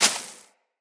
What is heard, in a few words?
Bullet Impact Debris Grass; Weapons